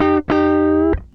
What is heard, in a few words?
FUNKY, Grooves